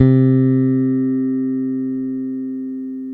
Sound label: BASS C3, Electric Basses; E-MU Producer Series Vol. 8 – Platinum Phatt